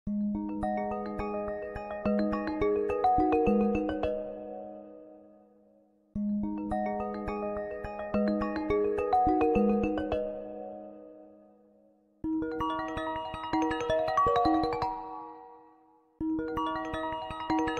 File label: iPhone IOS 17 Slowed; iPhone IOS 17 Faster